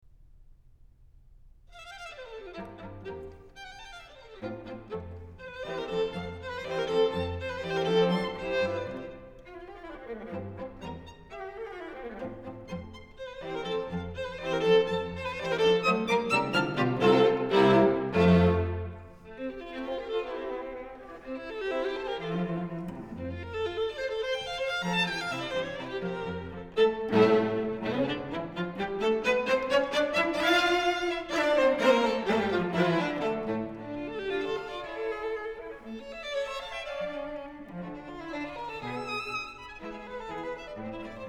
String Quartet No. 1 in F Major, Allegro; Ludwig van Beethoven; Beethoven - String Quartets No. 1-6, Op. 18

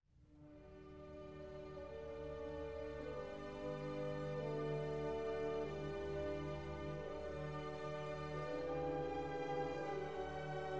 Piano Concerto No. 1 in D minor; Brahms

